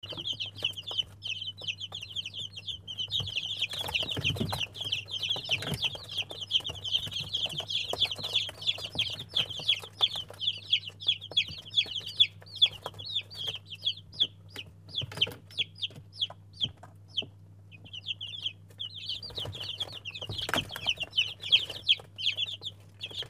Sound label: More Birds Kakopetria Cyprus